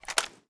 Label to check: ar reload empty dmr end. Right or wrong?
Right